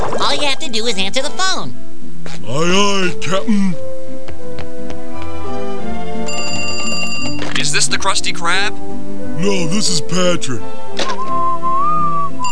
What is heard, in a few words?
phone